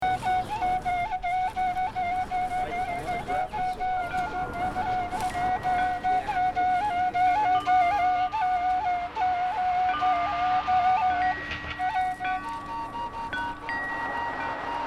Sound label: gong burgh steel town forests